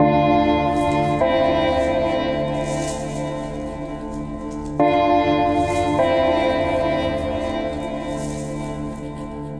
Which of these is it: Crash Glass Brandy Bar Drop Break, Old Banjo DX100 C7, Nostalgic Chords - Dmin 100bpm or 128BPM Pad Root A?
Nostalgic Chords - Dmin 100bpm